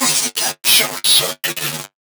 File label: Click Tick Game Over, Circuit Breaker